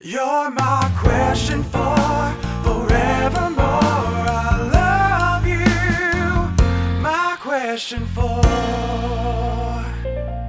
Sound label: Question Segue (retro); YDKJ; The Jackbox Party Pack 5; Jackbox Games